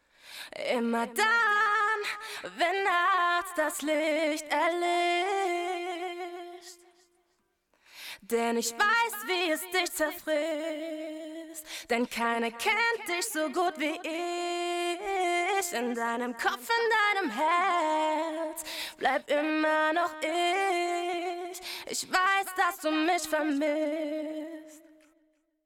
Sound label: tc-helicon voicelive play